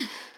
Breath; Lewd SFX

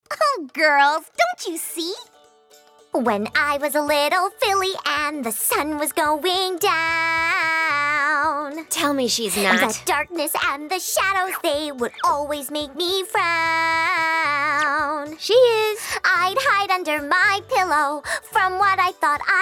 Vocals; My Little Pony OST Repository; Season 1; Bonus Music

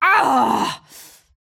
Short Scream; Lewd SFX

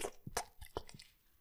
squelch; Lewd SFX

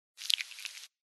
Suck; Lewd SFX

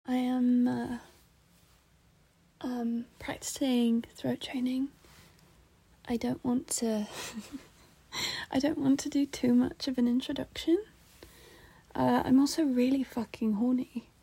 kissing, sucking; f4m; f4a; throat training, baby; pretty toys

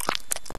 slosh; Lewd SFX

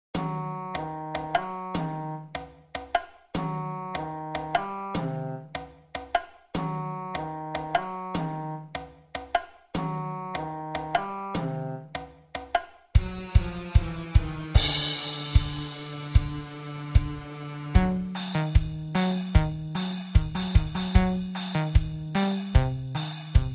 music

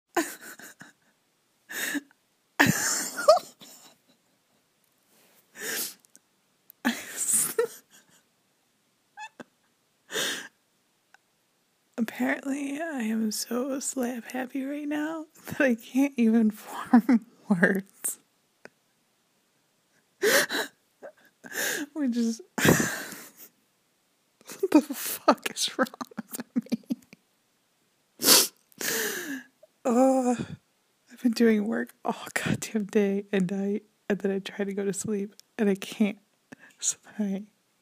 Giggle Trainwreck

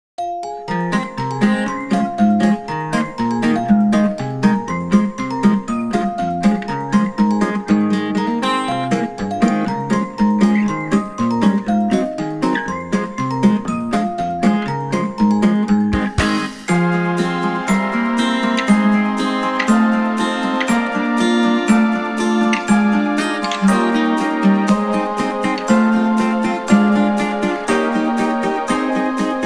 Instrumental; Friendship is Witchcraft

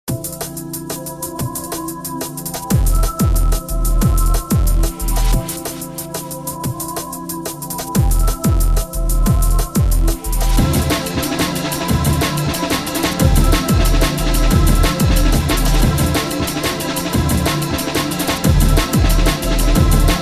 Liquid Funk Loop

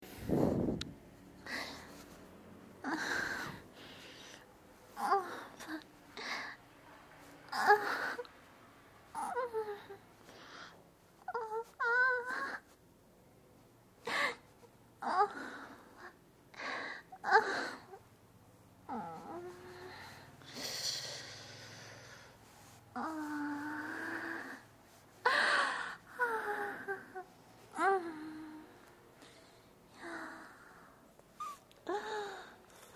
Mostly Just Moans, Fabric Sound; F4M; So Wet For You; Sorry For The Noise Outside

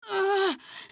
Grunt; Lewd SFX

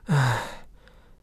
Moan

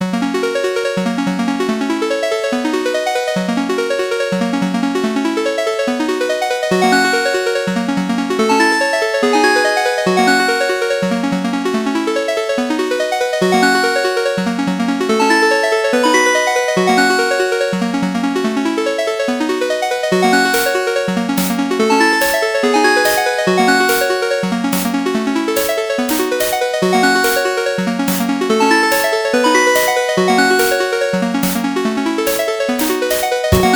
Arpeg8

